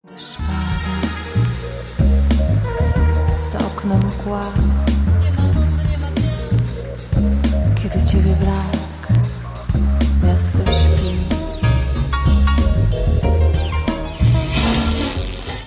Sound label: Smooth Jazz Skit